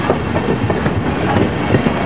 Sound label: train move; de transsibirian